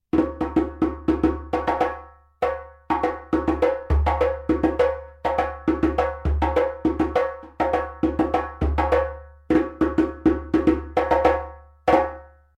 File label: Djembe accompaniment